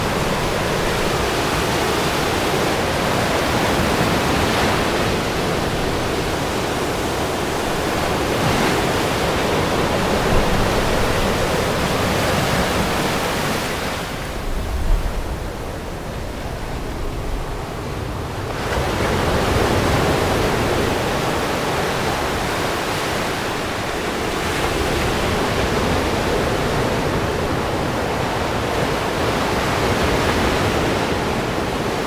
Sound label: sabal palm high tide stormy water